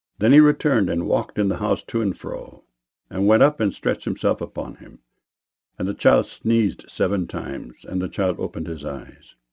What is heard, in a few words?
sneezed